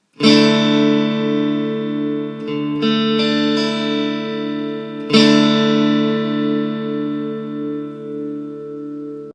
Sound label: dsus2, guitar